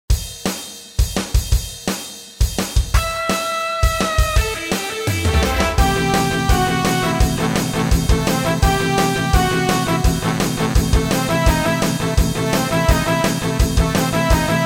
rock

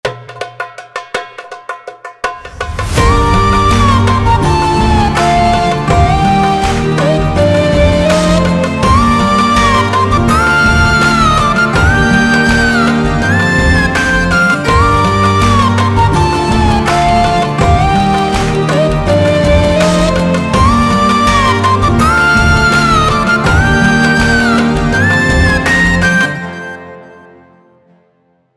epic

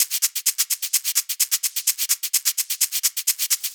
shaker loop

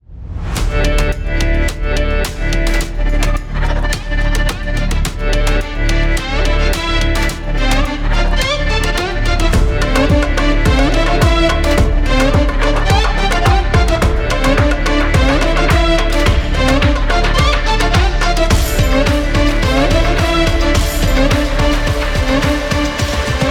ethnic tech house; טק האוס מזרחי; סימפלתי שני תיבות מתוך ההקלטה ששלחת, עם קצת אפקטים(טרמולו, קומפרסור

